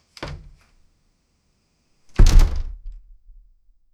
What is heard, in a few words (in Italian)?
una porta di legno, doors